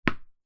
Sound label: sport tennis ball single bounce on floor internal